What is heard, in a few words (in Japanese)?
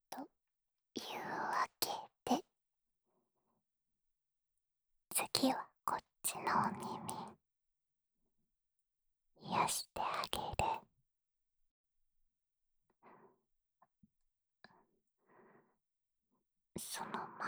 らぶらぶ耳かき（右耳）, ASMR